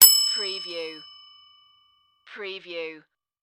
Bell, Front Desk Bell Ding

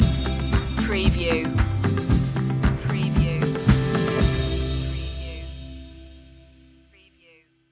turn that frown upside down stinger

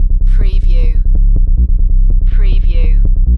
Techno-Trance Sub Bass Loop in C 142 BPM

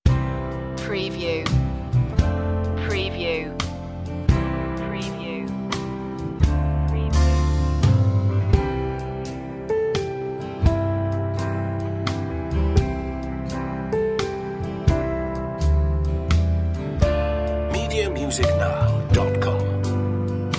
silent night country

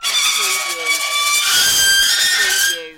Metal Screech Intense High Pitch

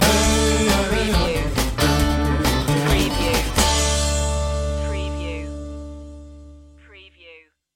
reservation blues stinger

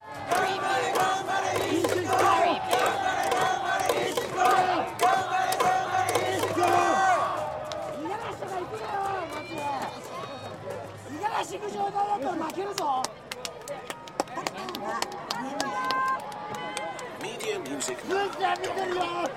Tokyo baseball crowd chant Japan; Swallows; lynne-publishing

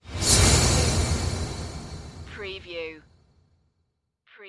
Whoosh; Sound Effects Bible

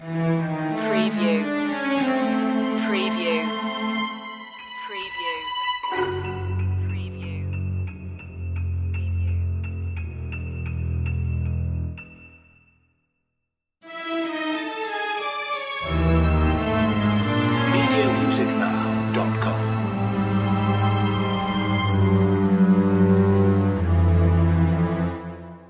halloween loop

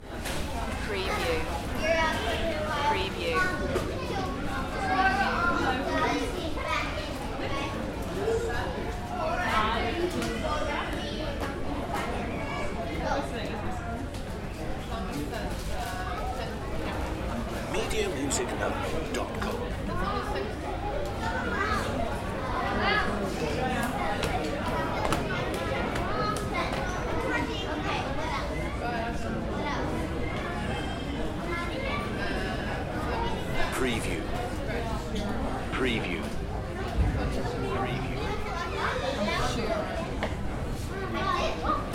Crowd, Airport Near Boarding Gate

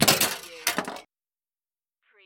Pinball sound, ball launched, slides and stops

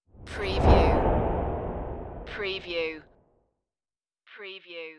Rush

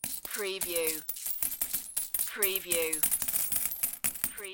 Many Coins Falling Onto Coins

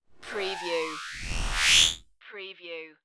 alien power charge

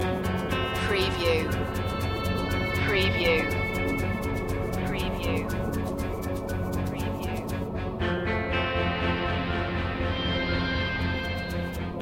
spy tension loop

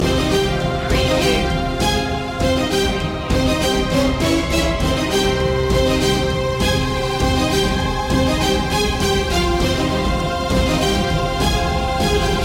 epic warfare

